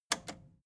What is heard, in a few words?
record player repeat click slow